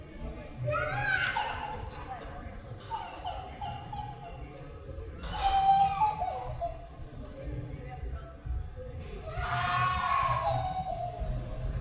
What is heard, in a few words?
Kid Tantrum